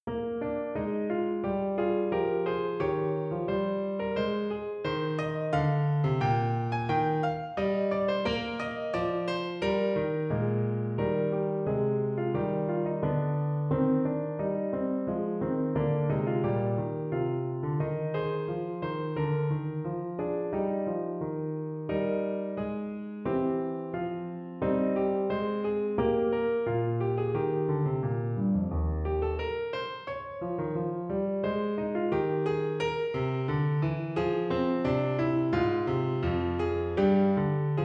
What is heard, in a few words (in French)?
trompette piano